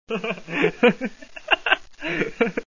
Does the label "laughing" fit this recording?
Yes